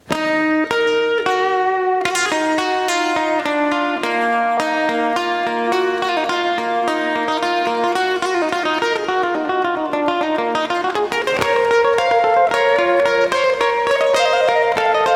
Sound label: Fuguetta, Instrumental